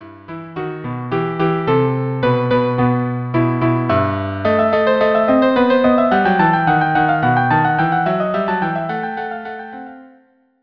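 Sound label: sevivon violin cello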